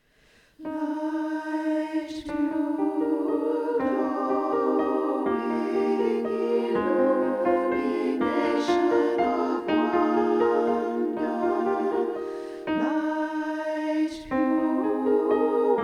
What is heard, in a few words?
SSAATTBB 2 soprano solos